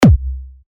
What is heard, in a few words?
BD Long; big room